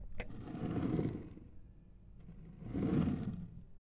Pushing Glass Across Table

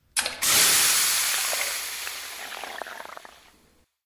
Acid Splash

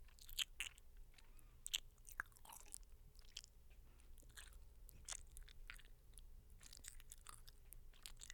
Chewing Gum

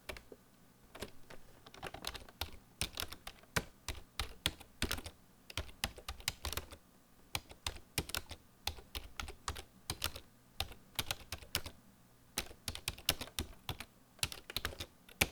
Slow Speed Typing

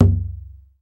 18 Inch Log Drum

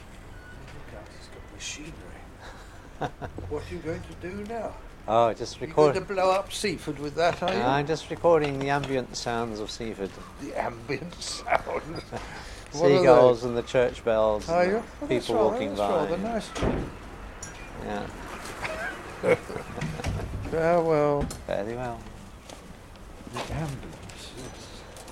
ambient sounds

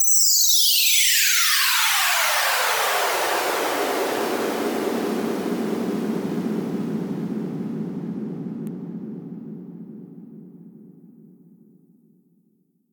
Glissando Downsweep

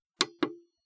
Button

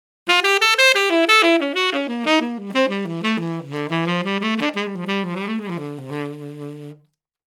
90bpm Weird Harmony

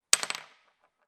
Chalk On A Table; Olympus LS10

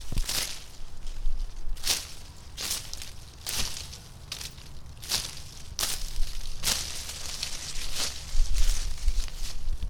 Leaves Rustling

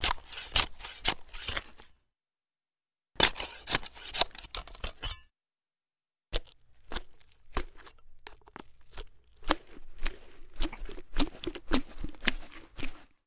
Knife Slice Cut Saw Flesh Butcher

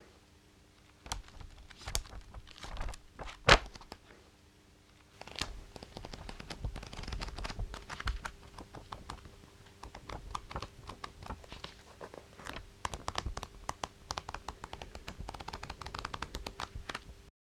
Pages Flipping Various

